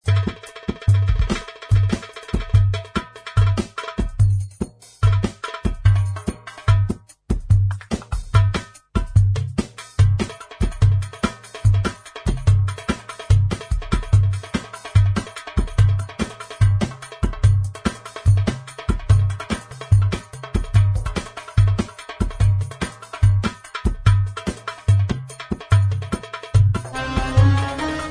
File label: genesys pro oriental